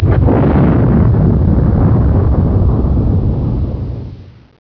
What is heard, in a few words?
my Explosion; The Iron Helix Demo